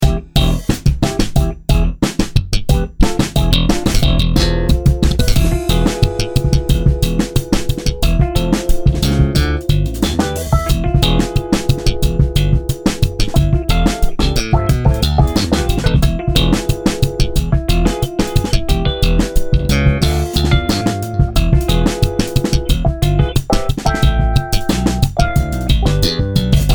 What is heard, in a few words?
bassy sms